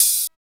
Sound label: HH HH, Dance